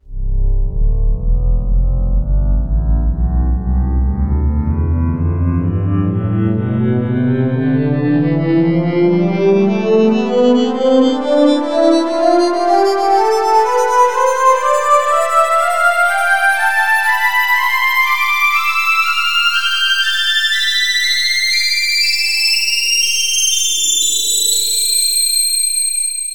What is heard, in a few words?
metal pad tonleiter